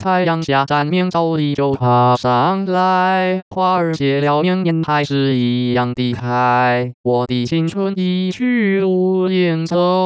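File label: synthetic singing voice, 男聲, smoothed; 使用頻譜包絡內插之國語歌聲合成 (Mandarin Singing Voice Synthesis Using Spectral Envelope Interpolation)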